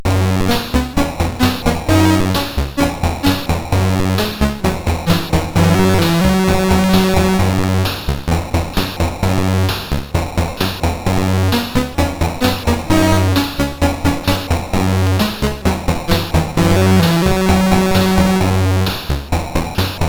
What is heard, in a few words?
Synth Sample III, CSG8580R5